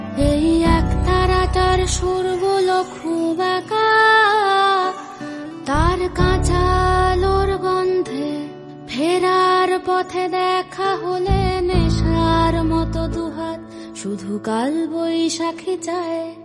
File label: Ringtone Bengali